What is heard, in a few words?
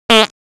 fx fart; Cartoon - film effects